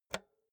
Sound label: insert xlr multi socket hard; objekte; elektronik